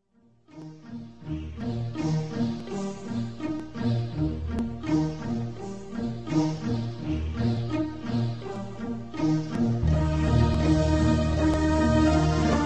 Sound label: instrumental